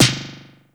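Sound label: delay snare, plastic drum; 90 sSampleCDs; Crypton - DrumBase5000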